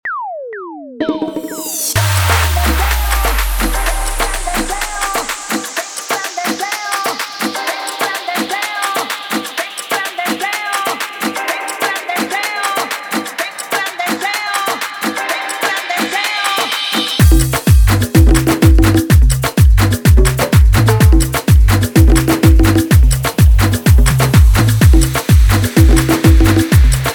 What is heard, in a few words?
intro outro 126 bpm